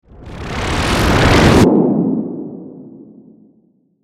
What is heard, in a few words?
Warp, Dimensional, Science Fiction